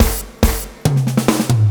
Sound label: Electro Fills